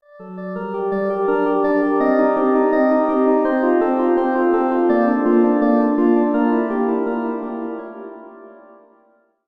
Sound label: Ganymede Organ Zeta